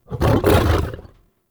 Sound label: Wolf Attack Growl; Animals